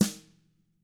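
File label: TAMA brass snare, Drums; ILIO; Double Platinum Drums 1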